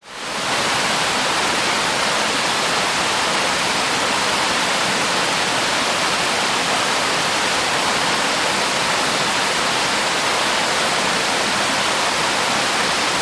nature waterfall 80m high base pool pointing up ortf